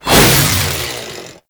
Chain Saw Tonfa Whoosh; Warframe; Grineer

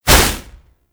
Fist Whoosh; Warframe; Mummy

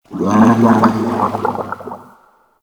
Inked Humanoid Large Attack; Enemies; Warframe; Shadowgrapher

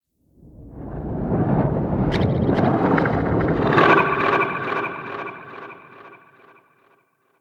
Ext Rumble, Ambience; RedNight

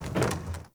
Pylon Plastic Roll; Warframe; Ambience; Nineteen Ninety Nine

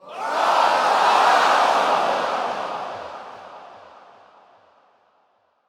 Point Missed Crowd, Lunaro Crowd

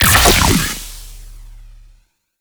2D Med Charged Shot; Crp BFG

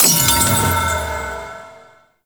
Crystal Destroy; Weave Boss

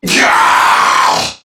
Death Crewmen; Warframe; CorpusCrewmen; CrewmanA

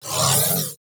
Crewship Turret Proj; Warframe; RailJack; Corpus Crew Ship

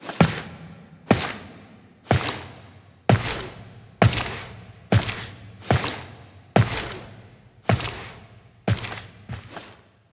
Fight Intro Foley; Cinematics; Tenno

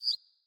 Bird Single Chirp; Galene Bird Guide

